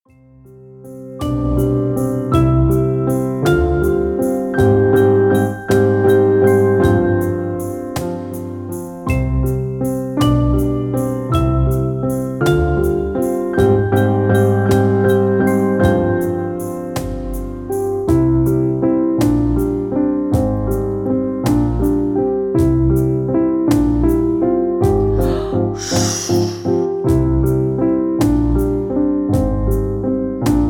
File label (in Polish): wiaterek rozrabiaka instr